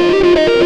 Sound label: xtra lead